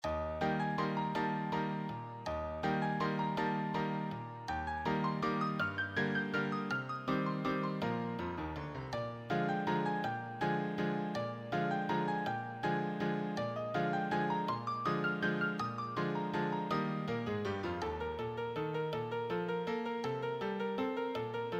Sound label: advanced solo, piano